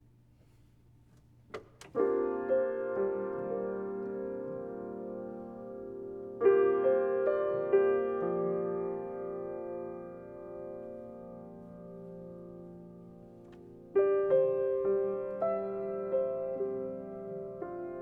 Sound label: F chords